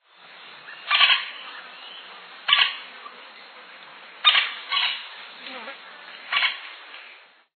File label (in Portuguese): pica-pau-de-topete-vermelho